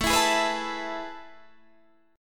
A Major 7th Suspended 2nd, chord

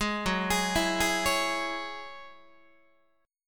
Gb 7th Suspended 2nd Gb Guitar, Gb7sus2 Chord; arpeggiated; JGuitar

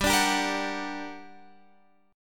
G Minor Major 7th G

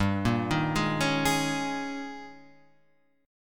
G sharp Major 7th Suspended 2nd Suspended 4th

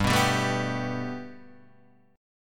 G Major Add 9th Guitar DADGAD, chord

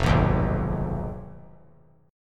F Minor 7th Flat 5th; strummed; JGuitar

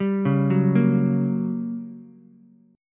G Minor Major 7th Flat 5th, G, down, Ukulele

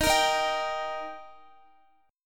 D sharp Major, Guitar; D# strummed; x,x,13,15,15,14

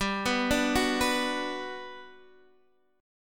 B Minor G; Bm/G arpeggiated; JGuitar

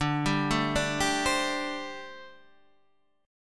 G Suspended 2nd Suspended 4th D

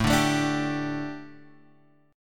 D Suspended 4th A, guitar

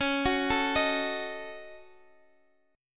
D sharp Minor 7th Flat 5th C sharp

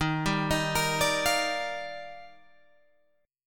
D sharp Major 9th, Guitar Open G

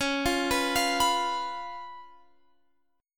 C sharp 7th C sharp, Guitar Open F; C#7; arpeggiated; tuning=Open F; guitar chord

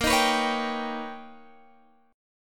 B Augmented Major 9th Bb, chord

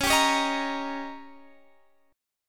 D sharp 7th C sharp, guitar

